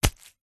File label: wet cloth dab squelch hard single; foley; zapsplat